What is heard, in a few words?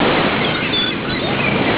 beach; mother2